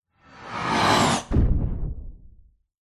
science fiction missle pass whizz distant explosion; zapsplat